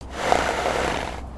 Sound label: horse idle; creatures organic